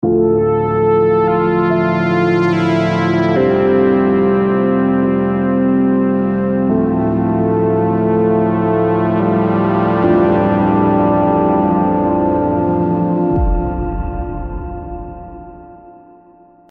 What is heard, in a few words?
lose